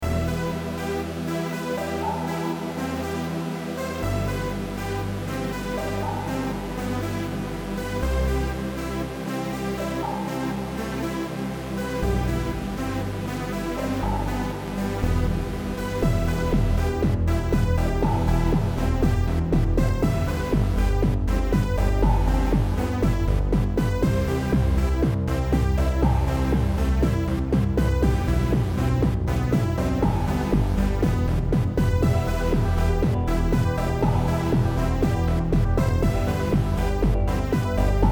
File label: Jingle; DOORS but Cheap